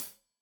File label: Hat Tight, Hi-Hat, Cymbals; Deeply Sampled Drums